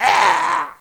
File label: goblin die; wesnoth